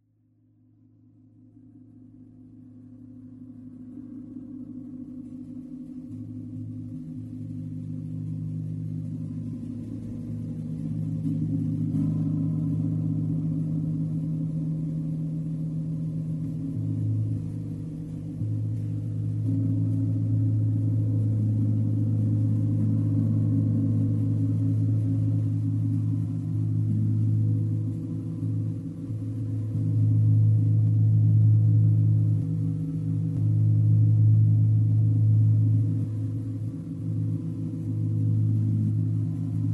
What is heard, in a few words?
gong; gongs and singing bowls